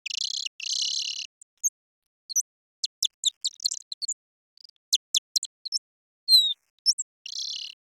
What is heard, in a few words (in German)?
Nyctalus noctula Gesang hoerbar 4x verlangsamt; Fledermaussprache